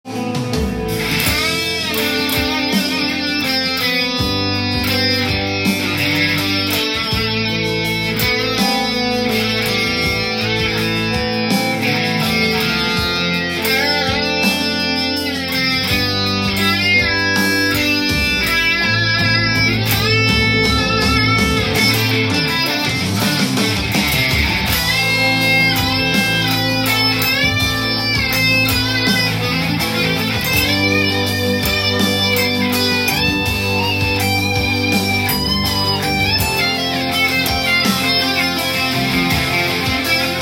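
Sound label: A minor original solo, guitar; perfect for output guitar solo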